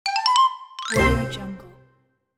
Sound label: classic cartoon game ending